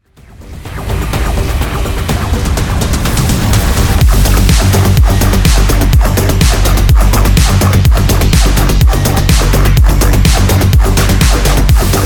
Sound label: friendly Fight Music